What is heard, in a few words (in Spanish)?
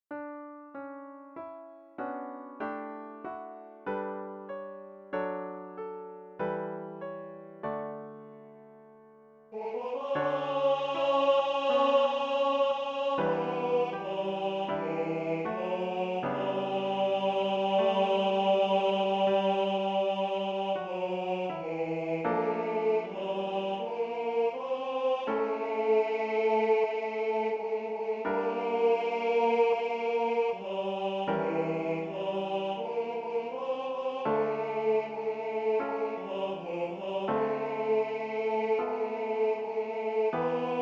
Voz, Tenor, solo; Cantata para SATB, Solistas y Narración